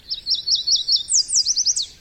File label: yellow warbler